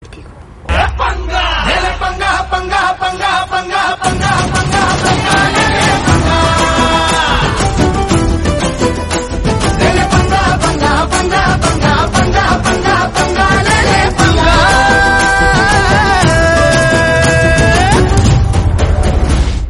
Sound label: dance music song tone ringtone, bollywood